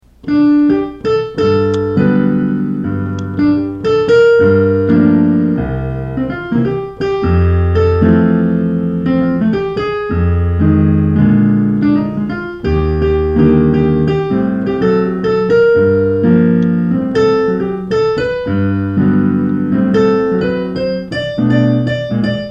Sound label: Major 7th Chords